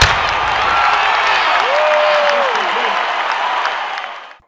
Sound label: Crowd Start; Ruckus